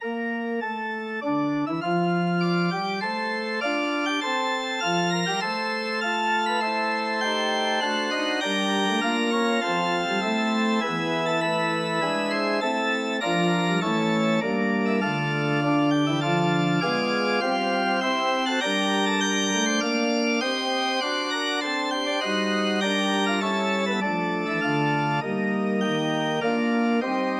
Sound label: organ